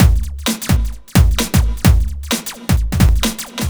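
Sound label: No Percussion, Drum Loops 130bpm; Zero-G; Soundclash Nu Dance Electro; Acidized Wavs; Terradome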